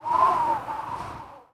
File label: Dialog Open; UI